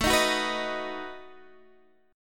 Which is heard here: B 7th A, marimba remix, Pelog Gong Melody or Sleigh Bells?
B 7th A